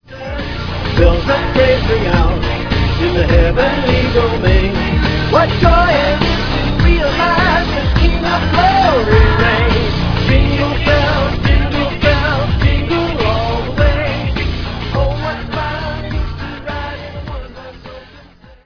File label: jingle